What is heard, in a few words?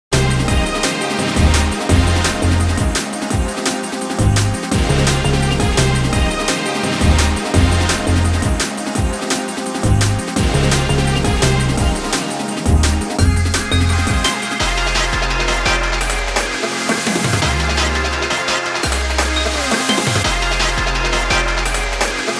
DnB